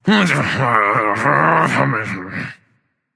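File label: nonverbal grumble; smite; voicepack; merlin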